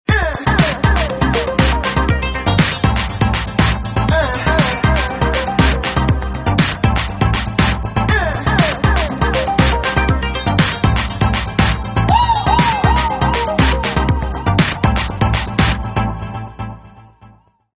Dive; Samsung X01SC Omnia PRO

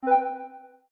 Touch Pop

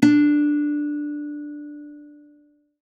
dial tone guitar

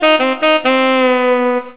Sax, Cisco Unified IP Phone 7945G

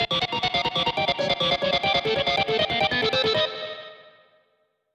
Signals, notifications; Vestel; Venus

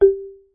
Marimba, notifications

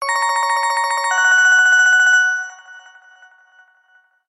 Default Ring, ringtones; Pantech Breakout

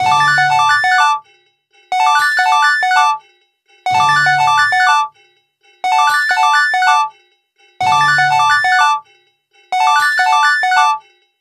Ring rangs; Sony Ericsson; W995 Alt

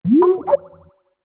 new user, Skype WiFi Phone; Netgear; SPH101

